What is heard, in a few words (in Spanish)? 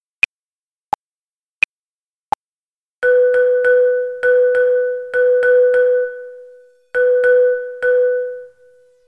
ordenar ritmos